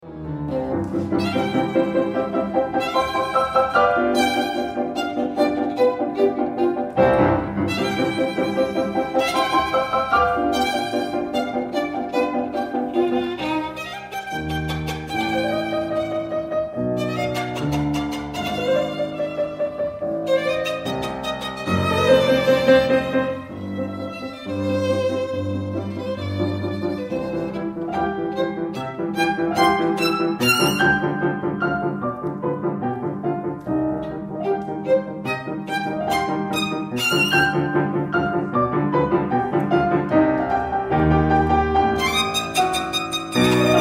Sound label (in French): sonate pour violon et piano; BEETHOVEN; le printemps, 02 allegro